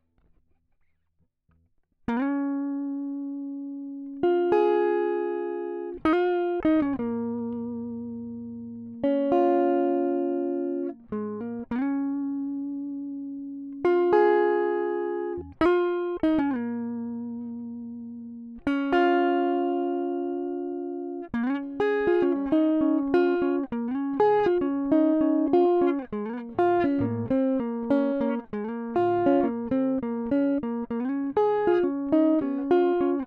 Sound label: Small Bright Combo; logic